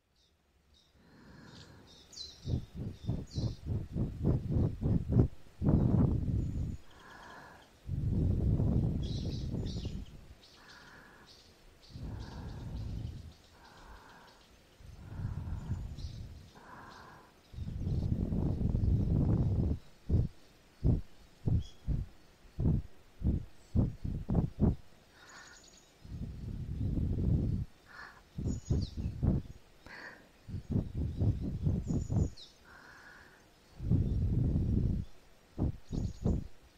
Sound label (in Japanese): 森の中で耳ふー(左右、森林、渓流); エルフメスガキ